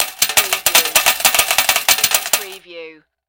Coin Slot Pay Out

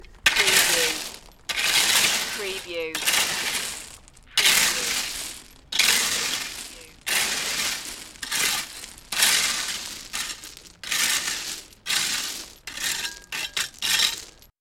Raking Gravel

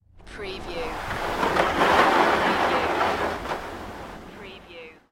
Skiing, Snowboarding, Snowboard pass by center

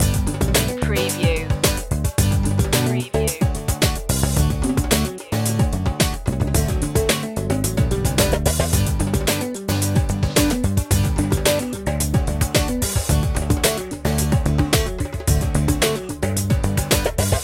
morocco souk loop

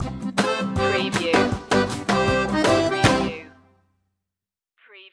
zydeco stinger

